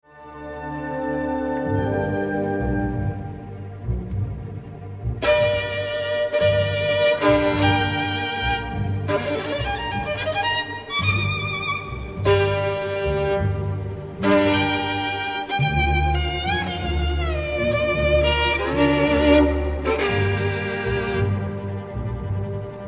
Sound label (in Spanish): Musica del Romantico; honduras musical 8vo grado